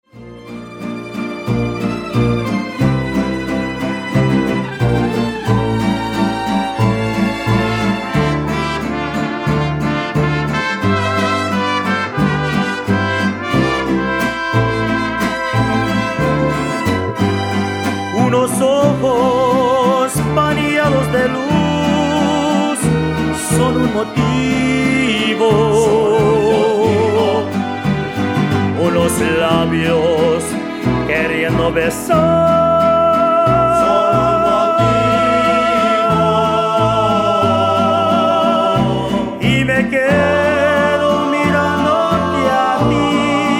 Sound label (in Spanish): mariachi; motivos